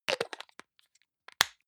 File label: hygiene small screw cup close hard; haus-garten; badezimmer